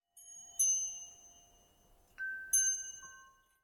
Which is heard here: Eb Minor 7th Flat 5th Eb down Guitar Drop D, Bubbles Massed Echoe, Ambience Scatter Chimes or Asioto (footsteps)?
Ambience Scatter Chimes